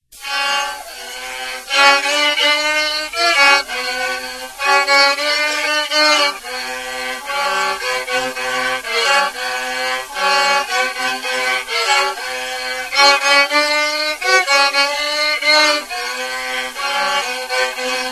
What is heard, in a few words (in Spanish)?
Pieza musical en Violin; festival luzmila patiño; fundacion patiño